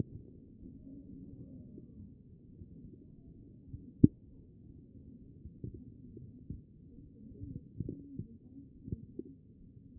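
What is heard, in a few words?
anterior chest, position 1; B MODE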